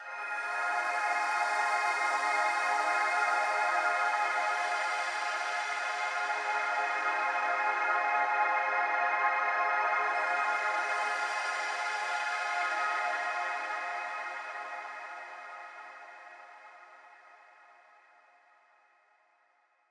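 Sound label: pad g sharp minor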